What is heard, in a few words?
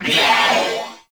Purgatory Warrior Death; Warframe